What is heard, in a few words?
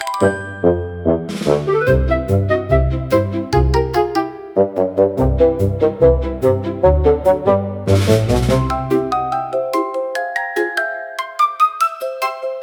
fantasy music cute bright